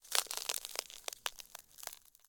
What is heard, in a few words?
ice, Ice Cliffs